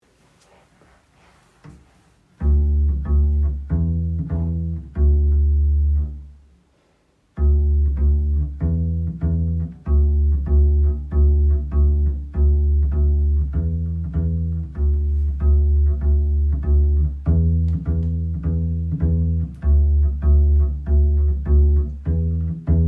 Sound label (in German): Loewenlied Bass pizz